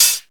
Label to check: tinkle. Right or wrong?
Wrong